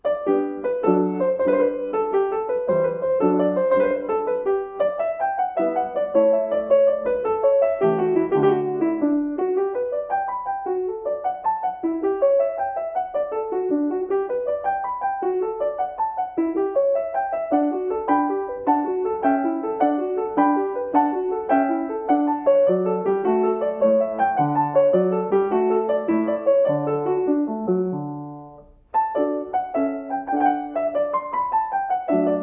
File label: Gigue for the Harpsichord; Sonata No. 6 in Eight Sonatas or Lessons for the Harpsichord by Thomas Arne